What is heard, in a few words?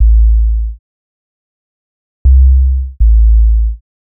Bass, Futurist Drum & Bass